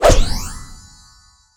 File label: Magic Whoosh Sparkle; transition